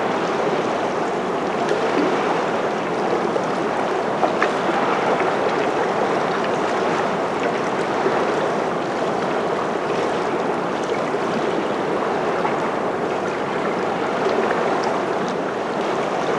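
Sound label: ambience lagoon water lap shore; Titanfall 2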